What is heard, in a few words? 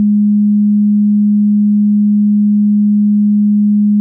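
Clean Sine DX100 G#2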